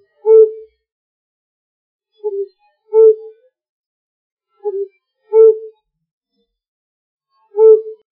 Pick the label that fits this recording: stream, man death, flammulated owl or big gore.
flammulated owl